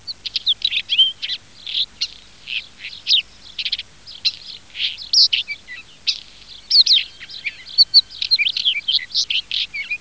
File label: Oenanthe isabellina; Каменка-плясунья